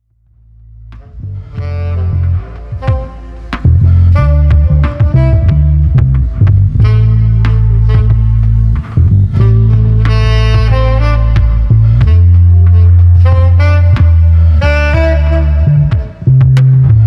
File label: Pirate Ambient Music